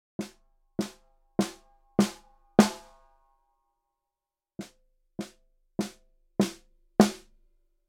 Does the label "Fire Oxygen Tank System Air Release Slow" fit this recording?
No